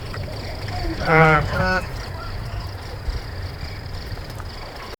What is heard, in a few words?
the gentle clatter of cla, savannah